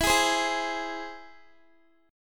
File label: E Augmented, Guitar; strummed